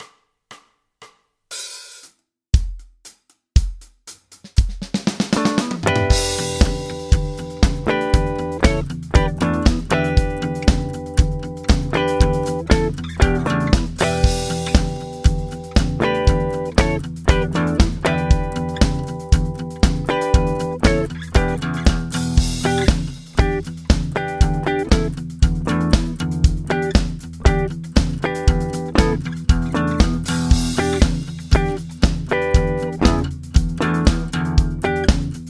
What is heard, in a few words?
Funky rock